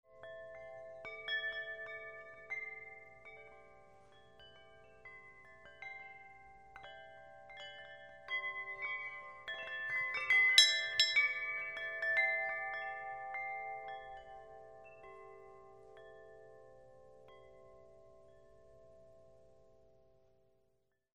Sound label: BELLS